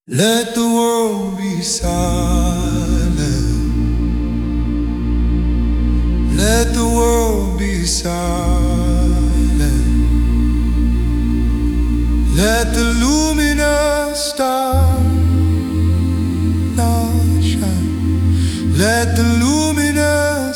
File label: Oxyrhynchus Hymn; 250AD